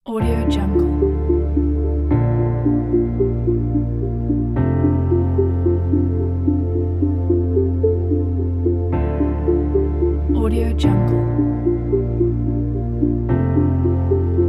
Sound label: technology business ambient